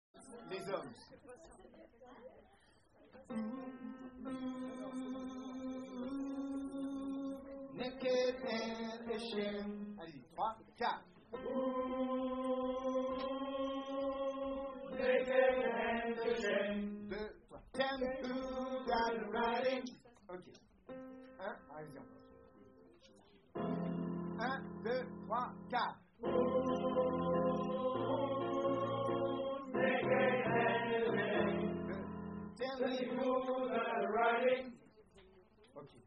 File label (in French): hommes